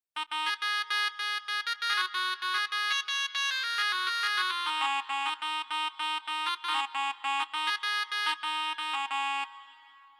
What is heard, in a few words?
surnaii